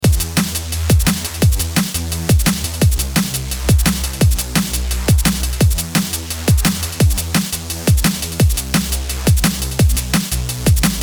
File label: DnB Drums DRY